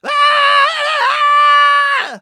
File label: scream; Scrat